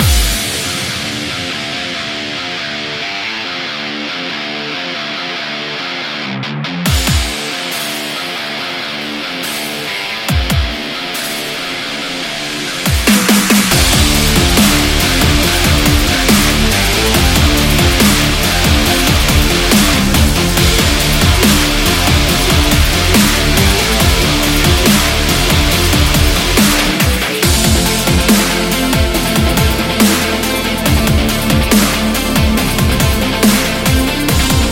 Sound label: Energy Break